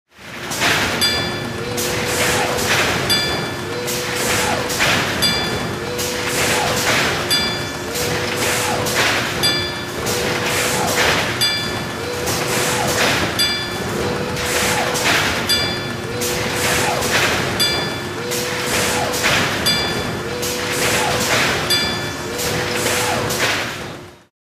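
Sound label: Cartoon Factory Ambience, factory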